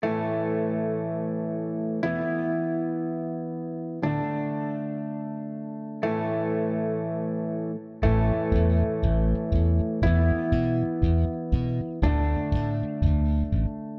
I V IV I Rock A

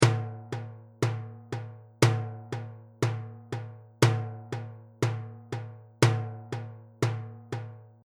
Rhythm Example 1 and 3

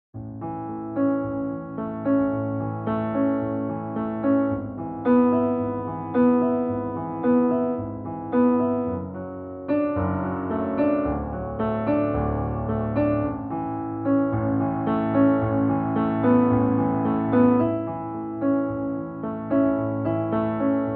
I V IV I Piano A

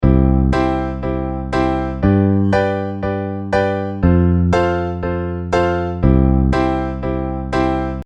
Rhythm Example Piano 2 and 4

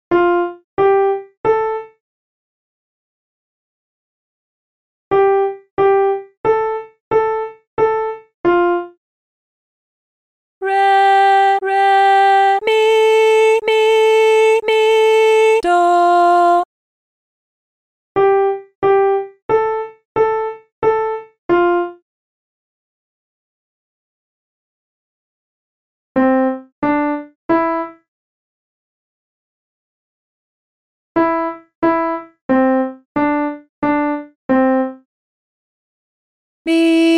Hard Training Solfa

